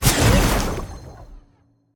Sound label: transformback; Smite; Sol